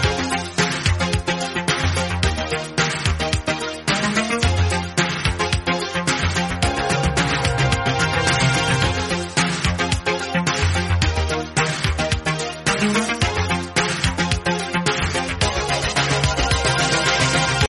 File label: disco